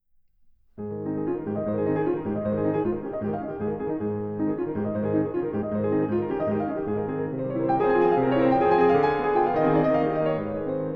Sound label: Small Prelude in A flat major, Piano, Solo; Chopin; Preludes